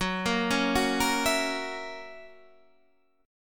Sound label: Gb 7th Gb down Guitar Standard, chord; arpeggiated; JGuitar